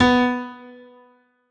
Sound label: B Note